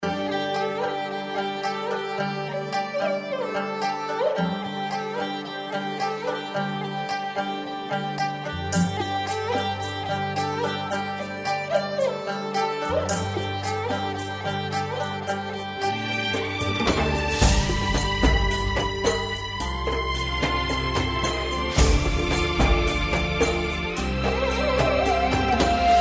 Instrumental

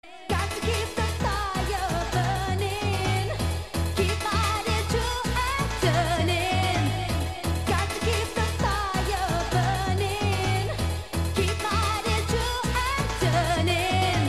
Chime Mix

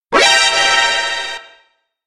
Alert; Metal Gear

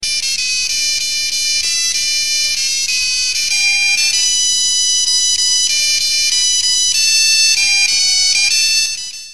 Annoying Ringtone

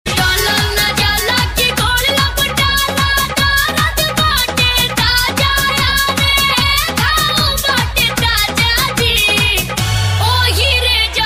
Bhojpuri

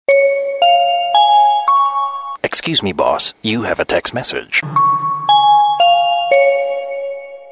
Cool Msg Tone

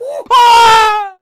ooof AHHHHH

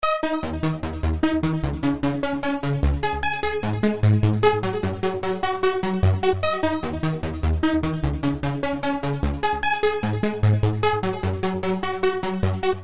Techno Countdown

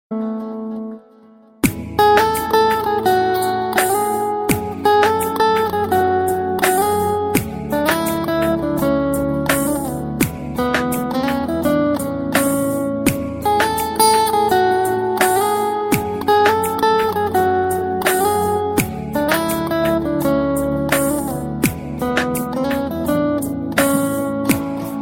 Romantic Ring Tone

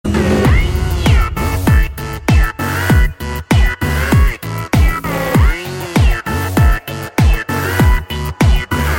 Telugu beats

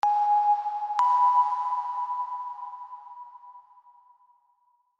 Bells

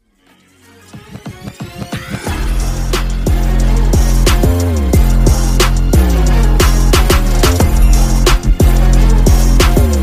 Arab trap

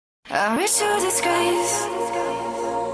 "Mmm"

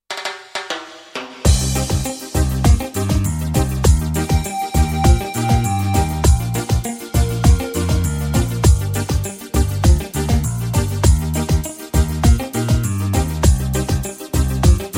Okarina

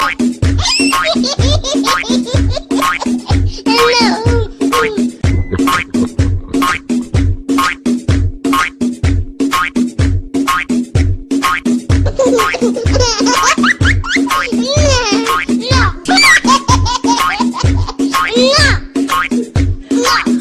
Baby Laugh

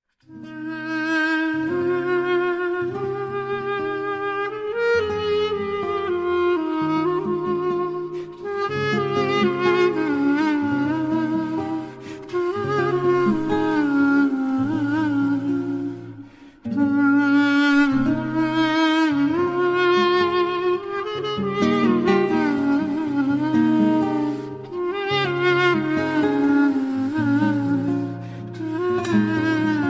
sad music

